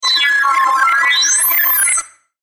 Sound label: Sweeper Digital Musical Fast Reverb; Production Element Imaging Element Accent Transition